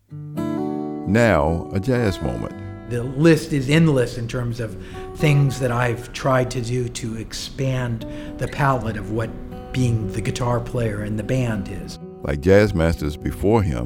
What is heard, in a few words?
Guitar Improv